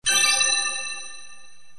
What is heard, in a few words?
coin; travel-japan; ikaruga